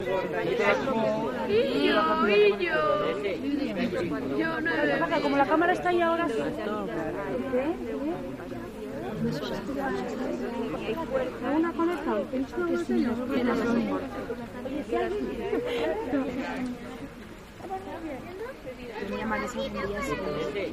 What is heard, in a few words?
Spanish Crowd A